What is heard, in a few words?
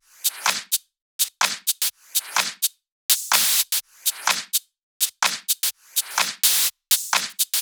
126BPM Kit; Netherworld Kit; Vengeance Samples